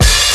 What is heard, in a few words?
Blastit Hat, Drum Machines; EMU Planet-Phatt